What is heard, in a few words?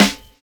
SONAR BEA; Univers Sons - Kitch 70s